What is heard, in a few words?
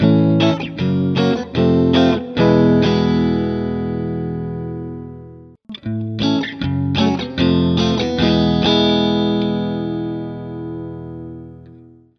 treble loss